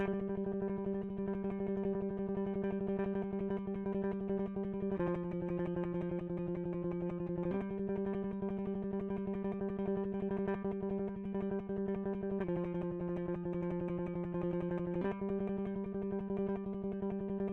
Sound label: electric